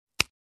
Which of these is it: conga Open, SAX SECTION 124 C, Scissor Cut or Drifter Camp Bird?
Scissor Cut